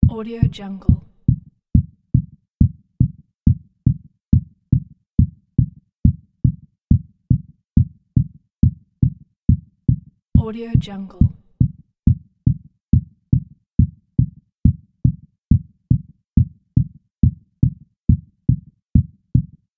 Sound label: fast heart beat